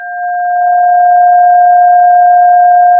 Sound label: pop, Sound of a cruise ship horn